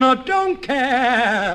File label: SUNG; gene wilder